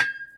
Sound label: post hit; Kids Games; DribbleKingsFootball